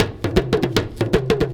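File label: big tama groove; Best Service; World Colours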